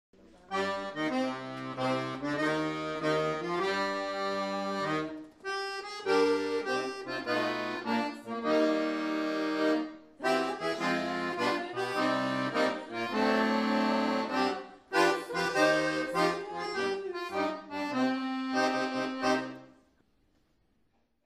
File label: accordion; Mellom bakkar og berg